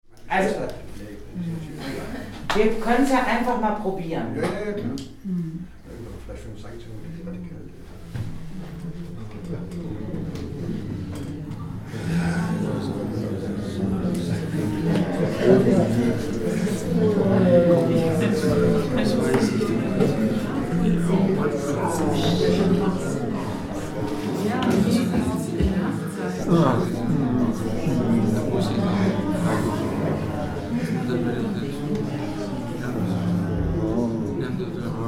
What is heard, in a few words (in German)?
Jammern Vokalisieren